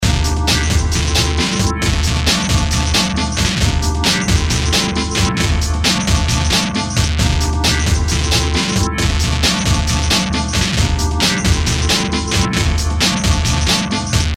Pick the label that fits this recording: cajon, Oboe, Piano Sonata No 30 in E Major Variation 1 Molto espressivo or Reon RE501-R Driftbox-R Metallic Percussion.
cajon